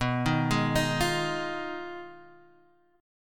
Ab Minor 6th B

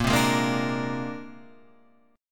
C 7th Suspended 2nd Sharp 5th A sharp

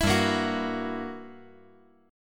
G Minor Major 13th, G

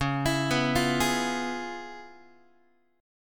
Db 7th Sharp 9th Db down Guitar; Db7#9 arpeggiated; JGuitar

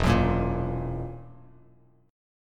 E Suspended 2nd Flat 5th E down Bass Standard; Esus2b5 strummed

